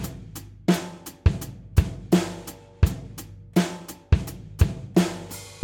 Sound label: Jazz